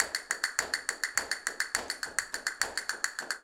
Castanets, Flamenco

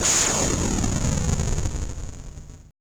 AMBI FX

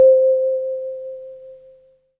SYN K-2000, Roland

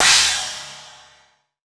China, ChinesePrc; Akai MPC500

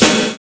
DEEP VERB

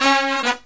Brass Riffs

